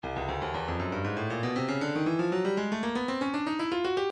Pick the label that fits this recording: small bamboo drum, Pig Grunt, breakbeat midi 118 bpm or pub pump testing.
breakbeat midi 118 bpm